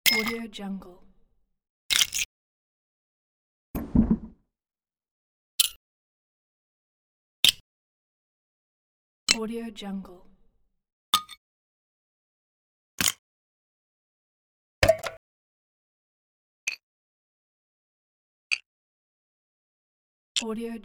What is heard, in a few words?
rpg game ui buttons sound effect pack